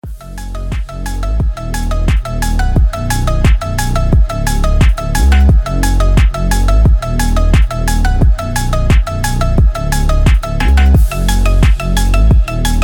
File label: epic phonk